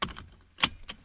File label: car buckle left; seatbelt; qbx seatbelt; Qbox